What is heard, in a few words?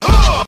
"huh"